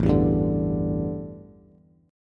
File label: A 7th Suspended 4th, A, down, Bass; A7sus4 strummed